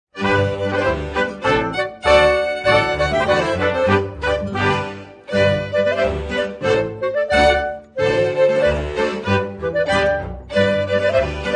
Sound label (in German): Kreuzpolka